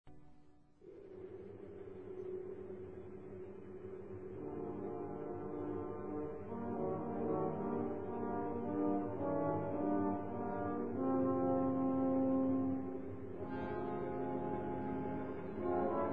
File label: french horn; western